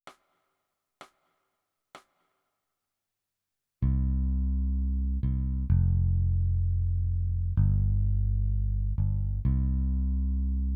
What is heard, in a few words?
Bass